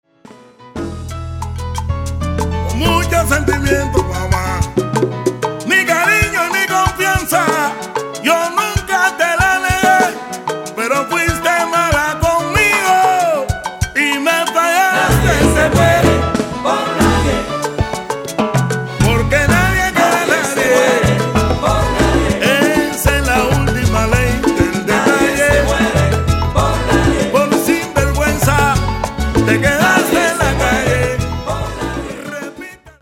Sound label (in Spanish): Banda Salsa Cubana